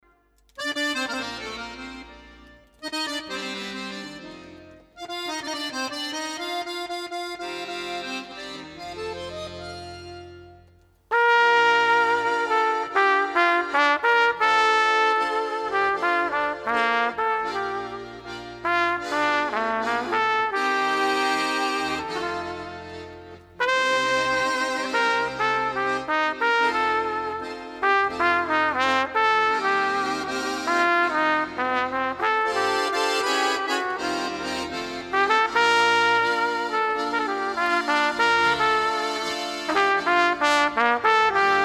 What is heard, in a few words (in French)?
trompette accordeon